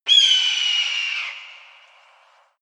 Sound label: hawk call squawk; natur-tiere